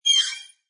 Digital High Pitch Shimmer Swell, Scifi; Scifi Production Elements, Set 2

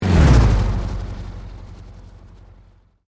Sweep Mechanical Slow

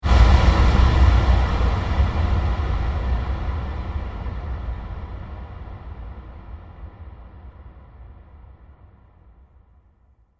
Cinematic Dark Hits; Sound Design; Hit Sound Effects

